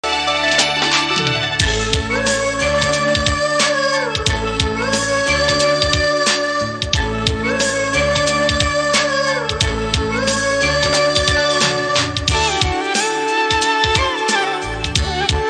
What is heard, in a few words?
title bgm trending bgm tamil ringtone